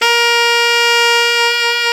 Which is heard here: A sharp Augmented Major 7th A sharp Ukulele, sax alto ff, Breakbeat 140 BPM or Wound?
sax alto ff